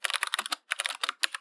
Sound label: keyboard typing